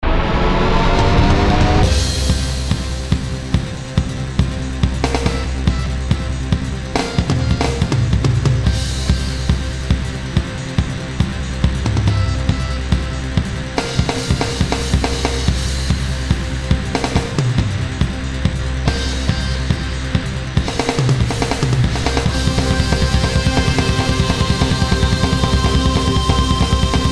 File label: heavy drums and synth